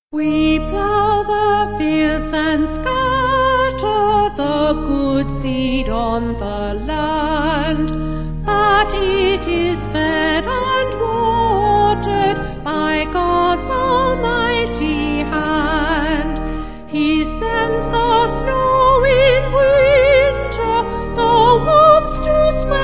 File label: We Plough The Fields Vocal Organ